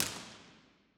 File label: Real Places Concrete Hall L; Impulse Responses; Ableton Live 12 Lite; Hybrid